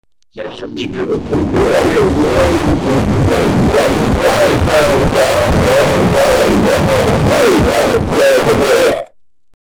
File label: defeated